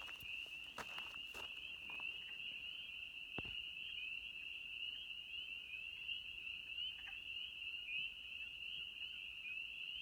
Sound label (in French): Grenouilles